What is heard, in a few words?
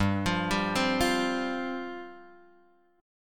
G 7th Suspended 4th G down Guitar Open G